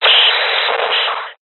science fiction radio signal interference burst

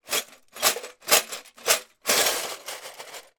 foley glass broken shakes in metal tin; zapsplat

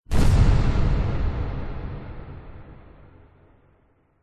explosion big powerful internal; zapsplat

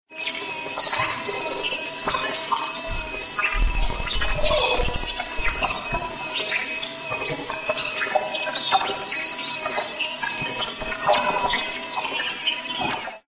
science fiction machine whir beeps weird

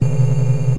science fiction electronic door open

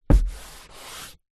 car hand or body part hit rub ceiling roof

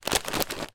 food mixed nuts bag shake; zapsplat

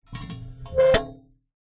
industrial lever metal old rusty pull

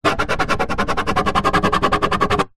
foley plastic smooth hollow sharp object scratch

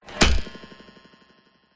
space arrow impact

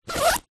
backpack small zipper

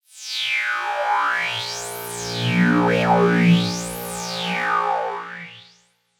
sound design phased panned electronic synth sweep

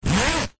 foley tent bag zipper; zapsplat

